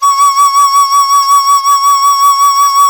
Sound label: CHINESE SET; Spectrasonics; Heart of Asia